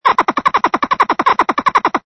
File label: ANIMAL CALL